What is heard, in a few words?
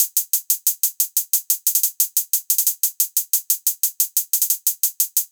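Electro Beat, rap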